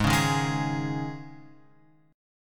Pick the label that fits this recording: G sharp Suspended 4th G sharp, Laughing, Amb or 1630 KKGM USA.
G sharp Suspended 4th G sharp